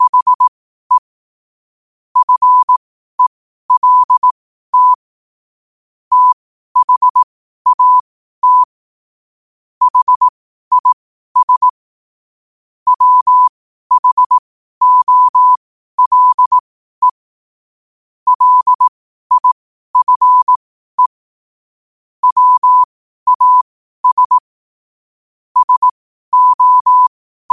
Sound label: 5 wpm, morse